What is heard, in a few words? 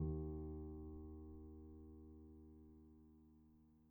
D#2, Grand Piano; bitKlavier Grand; Piano Bar